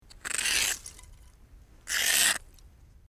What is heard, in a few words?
Ice Scrape Ice Scrape